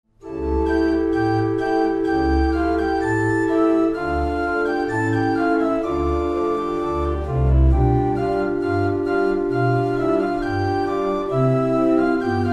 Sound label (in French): aeolian, Musique Mécanique